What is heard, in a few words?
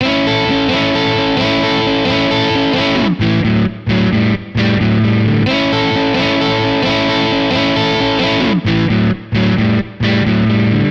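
Guitar Blues A major 88bpm; Guitar and Bass; Ableton; Factory Packs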